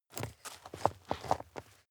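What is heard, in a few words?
step dirt heavy boots scuff, footsteps; schritte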